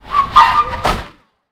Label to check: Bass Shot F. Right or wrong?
Wrong